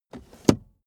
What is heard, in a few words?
car accessories cup holder cover close fast; autos; verkehr transport